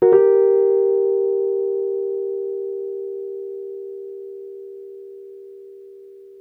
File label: Chords Hits; E-MU Producer Series Vol. 8 – Platinum Phatt; EP Chords Hits